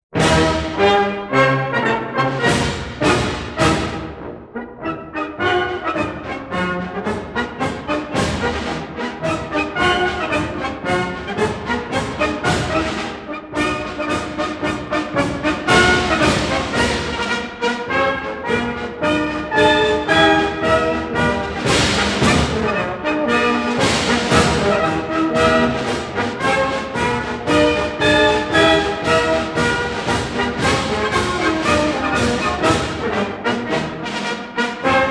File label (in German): Nussdorfer Marsch